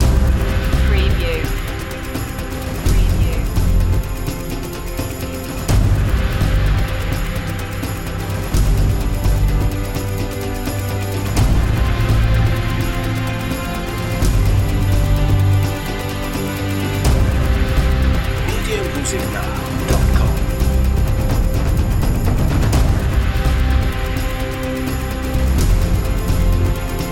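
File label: song of glory background choir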